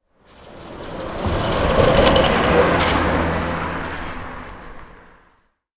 Fast Trolley Bus Passing

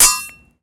Bullet impact Metal

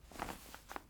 Bag

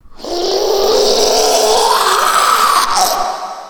Ghoul Reversed

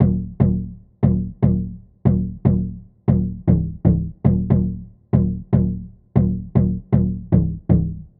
Deep House Chord Loop

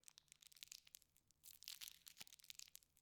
Sweet Wrapper

